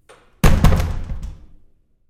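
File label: Door, Bathroom Stall, Close, Close Up, Med; Household; Big Room Sound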